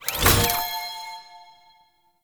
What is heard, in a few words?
Egg Pickup; Edens Ultimatum; Ayatan